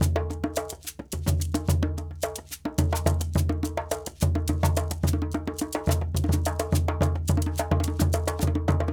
Djoun, Djembe, Shaker

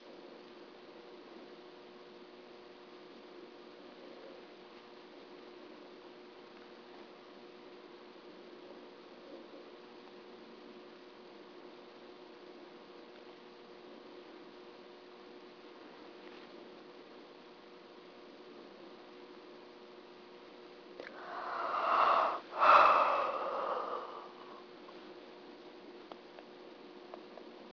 sigh

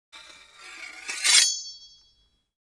sword shing; fights bodyfalls; Asian Show Sound Effects

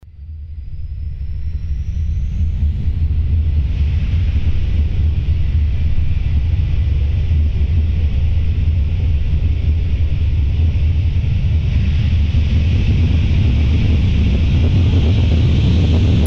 f16 hard limit

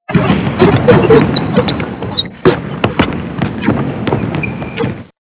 play basketball

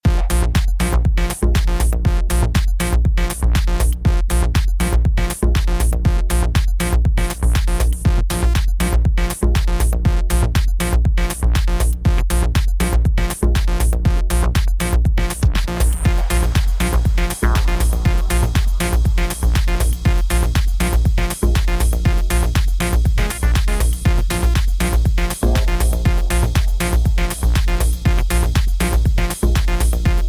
drone; flOw

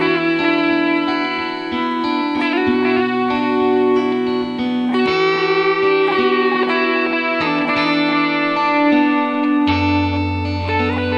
tranquil song

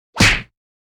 punch face hit; fights bodyfalls; Asian Show Sound Effects

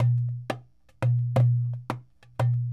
Dumbek

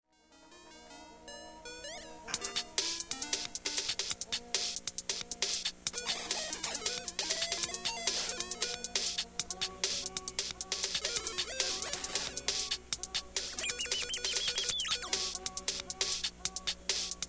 guitar

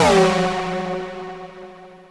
Doppler

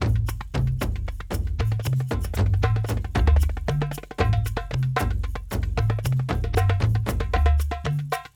Kendong

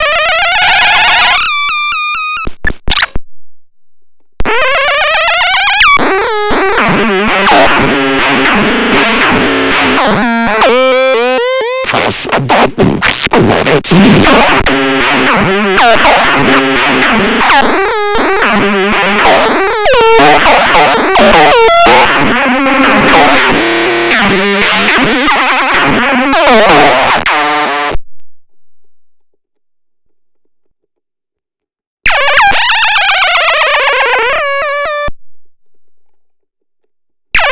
glitch

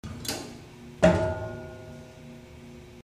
clong

